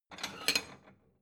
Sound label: plate small take slide